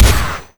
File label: pistol; pygame tile